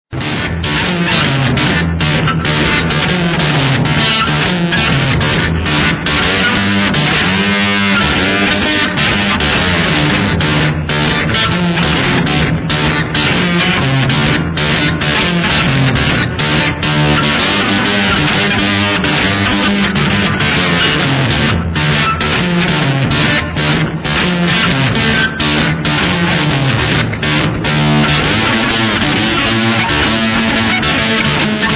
Lap Steel (open D); D.F. Duck

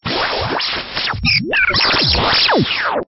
Stinger; STINGERS

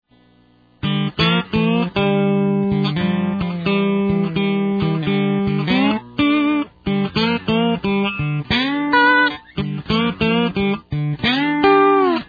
Lap Steel; D.F. Duck

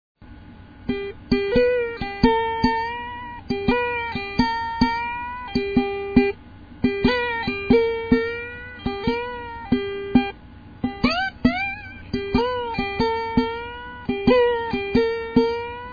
Ukulele (1 string and stick); D.F. Duck

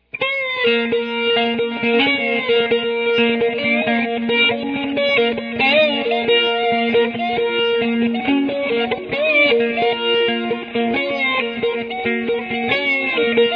Duck Ukulele; D.F. Duck; duckulele

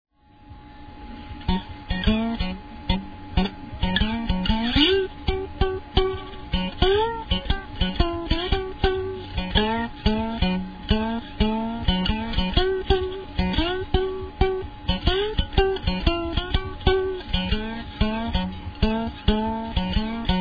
Ukulele (1 wound string); D.F. Duck

